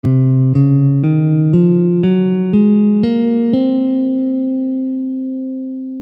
Locrian C, Guitar

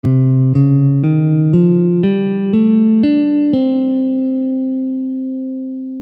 Locrian#6 C, Gammes & Modes · Guitar

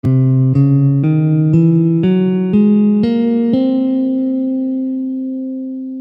Altered C, Gammes & Modes · Guitar

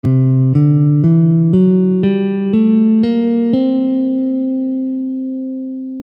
Lydian dominant C, Guitar

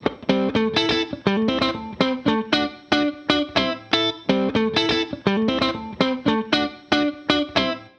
compresseur excessive

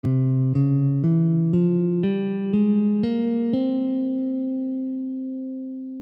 Phrygian dominant C, Guitar

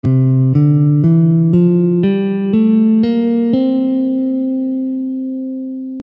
Mixolydian C, Guitar

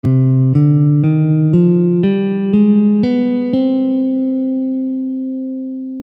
harmonic minor, Guitar

